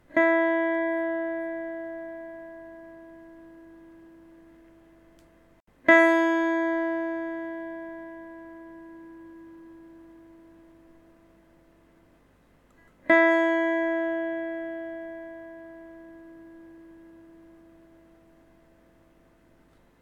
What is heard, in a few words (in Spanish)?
Sonido de guitarra eléctrica cuerda 1 Mi E